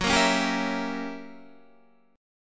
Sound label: Gb 7th Flat 9th Gb down Guitar Standard; strummed; JGuitar